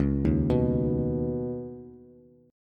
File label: Db 7th Suspended 2nd, Bass; arpeggiated; x,4,1,4; JGuitar